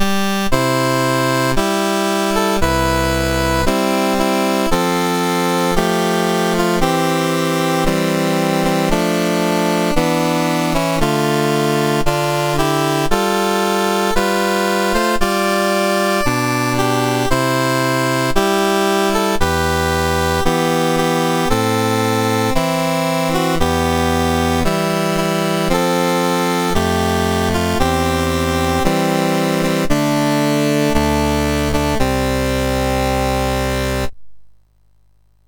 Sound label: sid MOS6581R3; Games Summer Edition menus